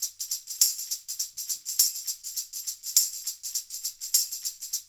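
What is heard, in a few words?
loop, tambourines; InVision Interactive; Percussion Slam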